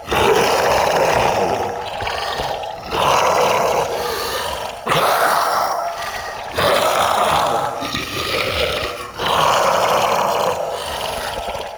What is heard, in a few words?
Mockery Male Breath Loop Run; Characters; Enemy; Vocals; Mockeries